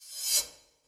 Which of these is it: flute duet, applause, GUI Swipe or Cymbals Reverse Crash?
Cymbals Reverse Crash